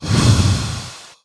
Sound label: Mage Lord Dash